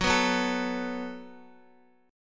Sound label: Gb Minor Double Flat 5th, Guitar; strummed; x,x,6,4,6,5; 4F,4A,5C,5G,5C,6E